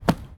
Step

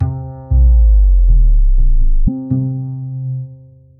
Bass loop

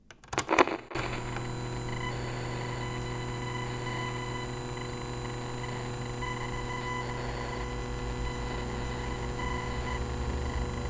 Radio Grain

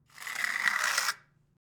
Cement Scrape Fast

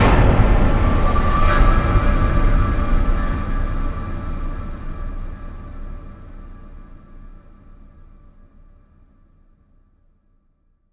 Explosion

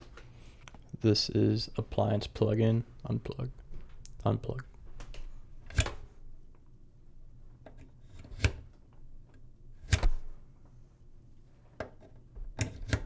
Appliance Plug In Out

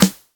Sky Snare

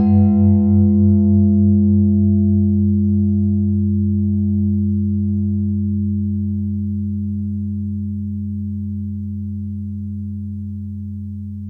Big Ben

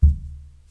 Floor bang

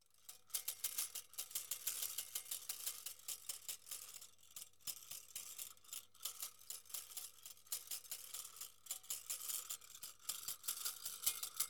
Light Metal Gears

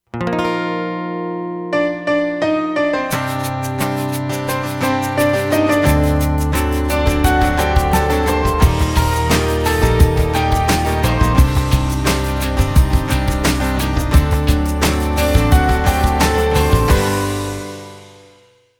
Piano

